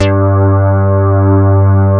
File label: SYNTH BASS, Bass Synthetics; E-MU Producer Series Vol. 2 – More Studio Essentials